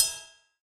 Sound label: ride one shot f sharp key